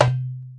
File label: Arabic percussion; ETHNIC and WORLD PERCUSSION; Arabic Percussion Kit 1